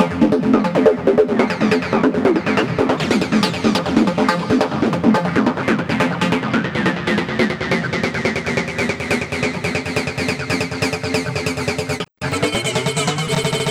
Metalik Rise; Sonic Foundry (Sony Creative Software)

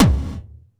GATE PWR DRM, effected drum; Crypton - DrumBase5000

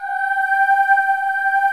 Boy Choir G5

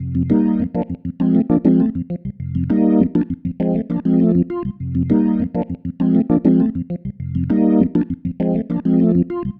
Bubblin, Organ; Sonic Foundry (Sony Creative Software); Joe Vitale Organ Donor

